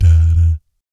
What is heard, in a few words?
BS DADAT, Vocal Planet Jazz & FX; ILIO; JAZZCOMBO